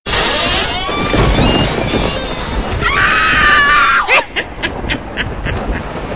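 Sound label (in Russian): скрип одиночный шумный с воплем и смехом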